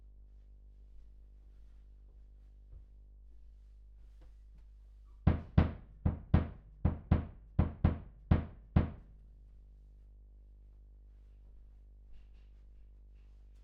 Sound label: Voc Omni